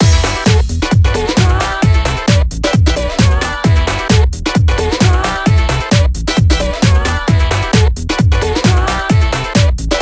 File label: Music Main Menu; Sonic Momentum; SAGE 22